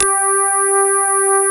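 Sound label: JV electric piano; Pro-Rec - Synthsonic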